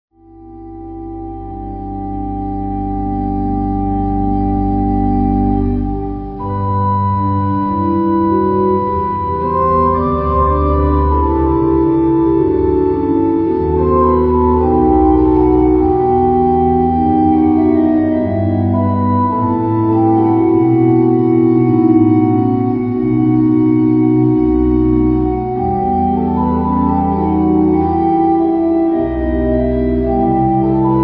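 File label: koororg, choir organ; Oosterhout; St Jan; BWV731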